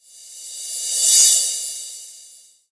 crash sample a sharp key, Royality free crash sample tuned to the A# note. Loudest frequency: 7480Hz; cymbal drum samples